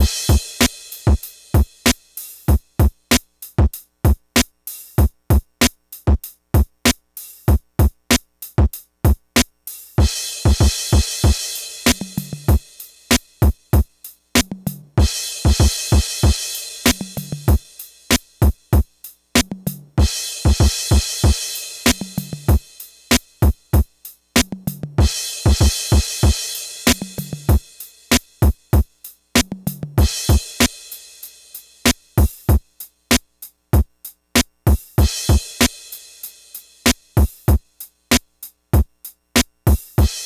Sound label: Drum kit